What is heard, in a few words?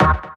Synth Shot D#; Mutekki Media; Vengeance Electroshock Vol.2